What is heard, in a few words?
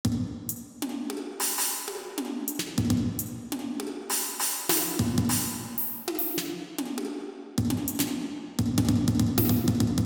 drumbit